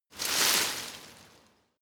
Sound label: scrub small branches hit; haus-garten